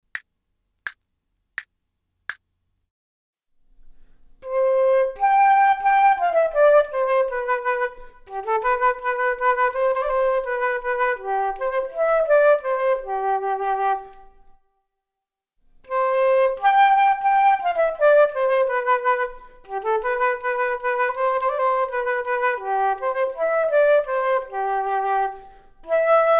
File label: Duets flute; Selected Duets for Flute Podcast